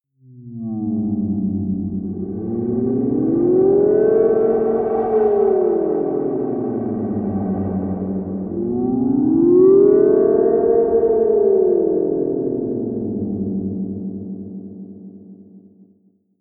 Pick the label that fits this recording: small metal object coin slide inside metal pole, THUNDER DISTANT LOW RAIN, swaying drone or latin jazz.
swaying drone